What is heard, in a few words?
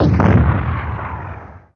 Boom; Falcon4